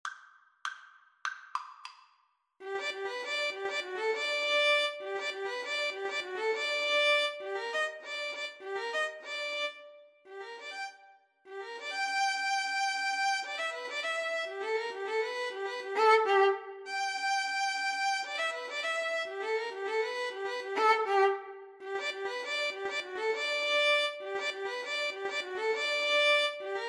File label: maple leaf rag violin duet